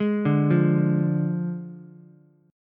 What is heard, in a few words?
D Diminished D, Ukulele; arpeggiated